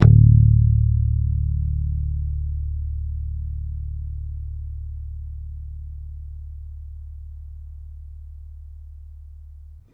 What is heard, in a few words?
Bass one shot Pick Long Low D, Electric Bass; Slate Digital BASSHOLE Sample Pack